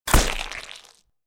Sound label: slime impact